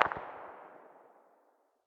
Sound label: ak very far distance, 5.45x39mm; tacz-tweak-pack; taczmechanics